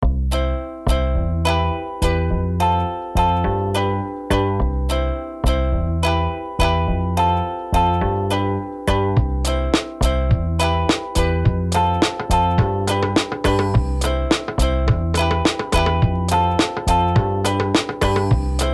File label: door